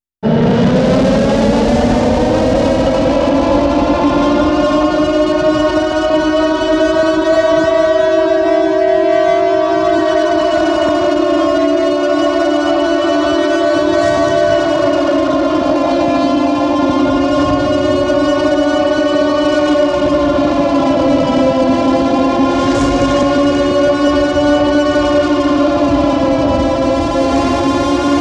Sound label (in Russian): trevoga